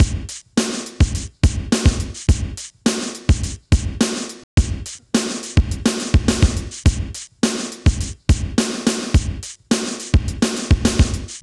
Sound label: old pop, Drum loops, Electro